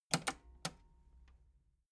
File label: metal button cassette rewind slow